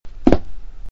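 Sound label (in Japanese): kutsu, shoes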